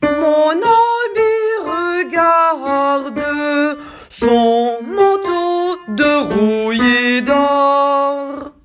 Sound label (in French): refrain phrase 1 voix 2